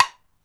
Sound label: Foley Hi